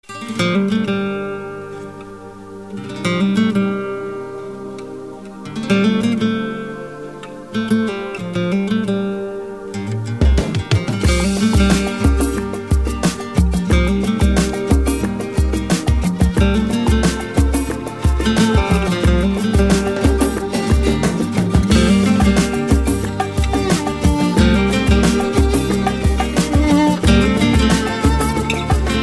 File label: all right guitar; reggae